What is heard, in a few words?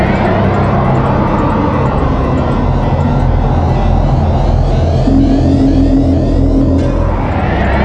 arctic; The Rift